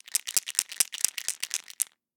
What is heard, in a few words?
Pill Bottle Shake; Medical; Blastwave